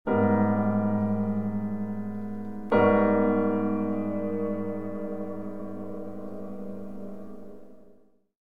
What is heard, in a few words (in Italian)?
elettroacustica